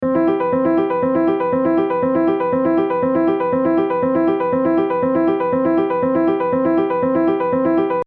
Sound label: With Arp